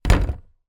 household metal object down on plastic dustbin trash lid